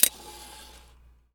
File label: flaps click, c172p